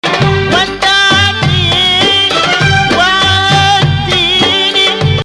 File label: 2nd Rast, maqam